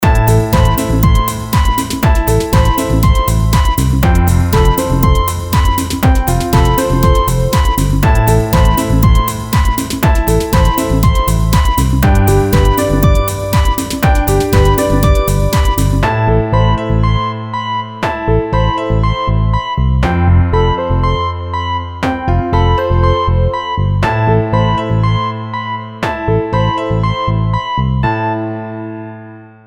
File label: Dance Grooves